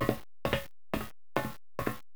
metal walk

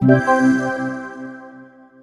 Jingle Staff roll Get

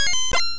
Steel Wing; sfx; attack moves; pokemon; gen 2; gsc

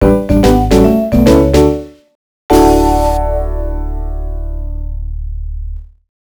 Minigame Win; la prova del cuoco ds gamerip 2009

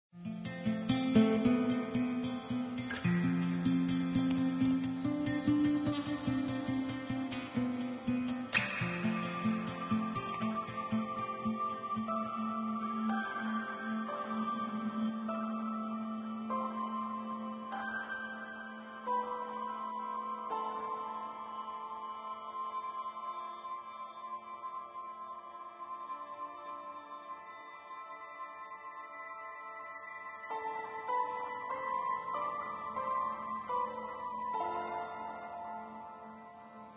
Harbour Sting; ether one redux gamerip 2015

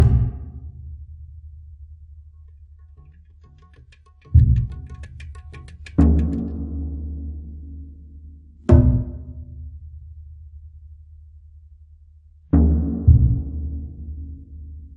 Jungle Level

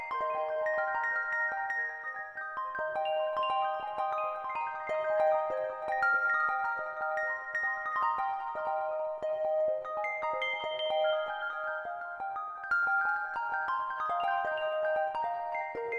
Autumn Rain; Proteus

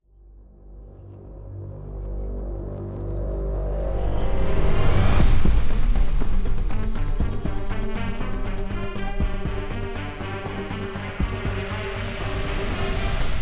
Character Select; apex legends season 17 18 ps4 switch windows xbox one gamerip 2019; NeonAssassin; SoloMode

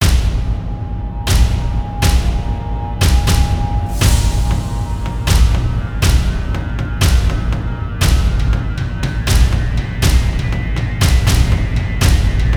Drum Arena; Mortal Kombat Deadly Alliance; Xbox

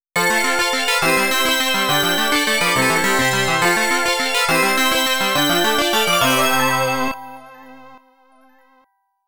GAME OVER (Game Over)

